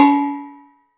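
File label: BONANG, Metalophones, Pitched Percussion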